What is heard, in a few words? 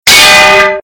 rising ram impact; billy ed edd n eddy sfx